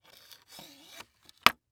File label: Place Pool Stick Into Case; Sports Case